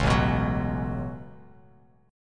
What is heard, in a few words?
A 6th Add 9th A down Bass Standard; A6add9; strummed